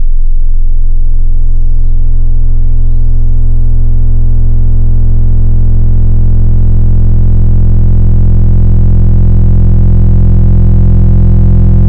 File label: Fake Square; Synthetic's Serum Collection; Cymatics - Vibes Wavetables